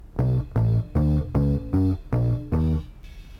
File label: Bass only